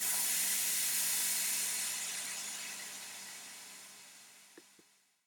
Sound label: pshit